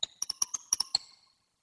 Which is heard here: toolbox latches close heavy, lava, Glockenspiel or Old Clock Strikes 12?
Glockenspiel